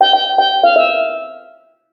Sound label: Drum Puzzle; escape academy; Escape From Anti-Escape Island